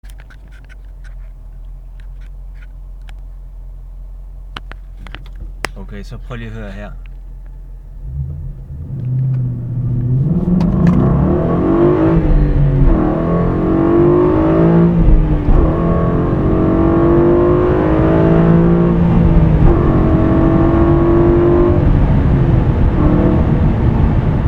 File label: Mustang GT V8